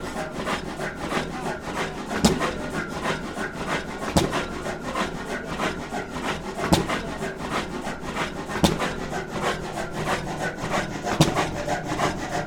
coolspring power museum blaisdell 65 horse power compressor engine running exhaust moving; moving air; sonniss gdc